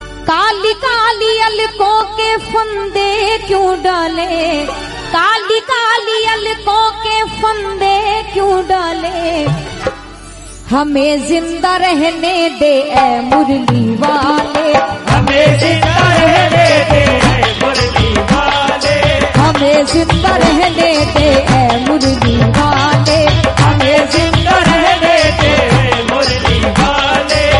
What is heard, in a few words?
Hindi Devotional Lord Krishna, bhajan